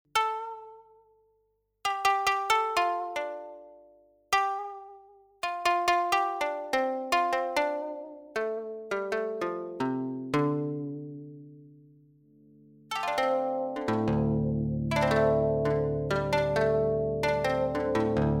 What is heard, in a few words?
Koto